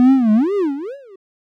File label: Nausea; Syrup 2 Candy Alchemy RPG